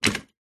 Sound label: office chair set down tiled floor